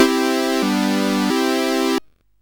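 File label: Quadrant 4, MOS 8580 SID chip music, games; CSG8580R5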